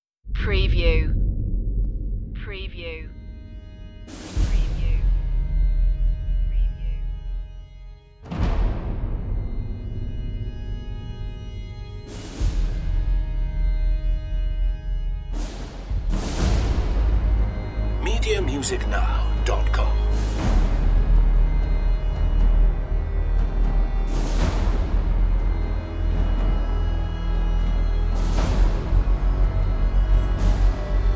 crossing the border no ticking clock

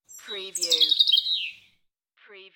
UK Birds; beanstalk audio

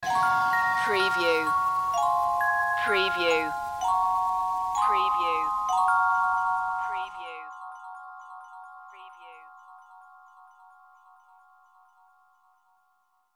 soulful ballad sting

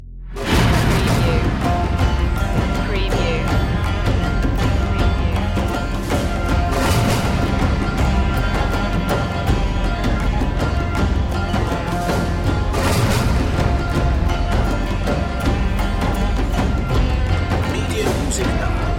eastern tension

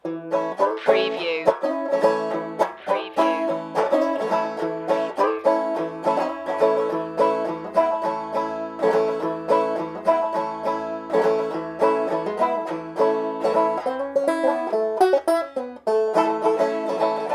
banjo only

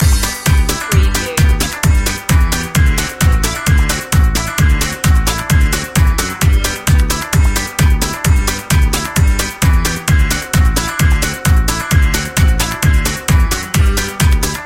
flohwalzer loop

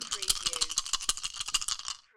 Dice Shake Dice In Hand

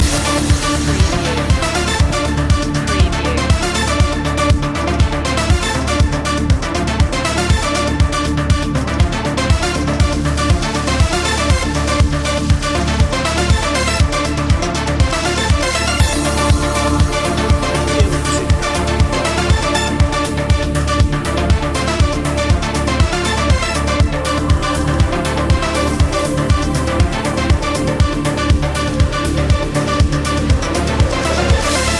arp loop

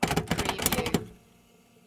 Cassette player switching sides; beanstalk audio

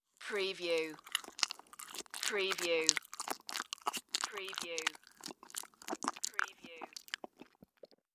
Chew Food Long

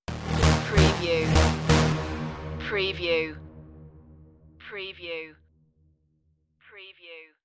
the groove is all around stinger

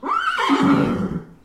Horse neigh indoor

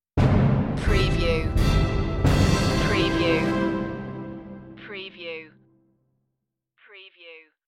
warm summer day stinger

